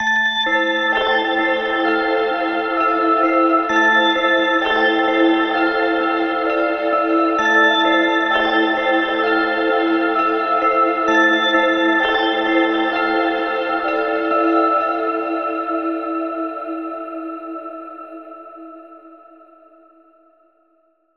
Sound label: Bells Short Am 65Bpm; Construction Kits; Ghosthack; SkyRealm; Solace in Falkreath